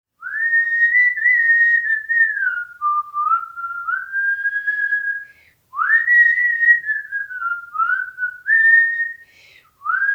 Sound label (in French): chant chamanique